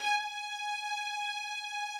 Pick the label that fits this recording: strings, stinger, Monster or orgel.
strings